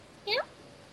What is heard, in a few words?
meow